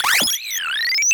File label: retro computer code signal; sonniss gdc